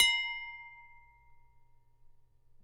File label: Glass A ff